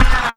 Paper Hit; Sonic Foundry (Sony Creative Software); Esoterik Beatz; Paper Fx

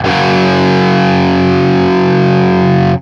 5TH F#, Guitar; East West; Steve Stevens Guitar Samples Collection

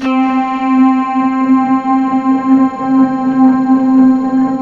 guitarine C4

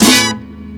Horn Hit, Drum & Bass One Shots; Misc

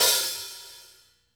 OPEN, HI-HATS; Sampleheads; New York City Drumworks VOL-1; SP HI-HATS

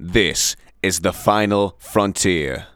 vox; Ueberschall; Techno Trance Essentials

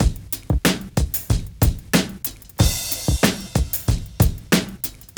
DRM CHOP 93; AMG Gota Yashiki Groove Activator

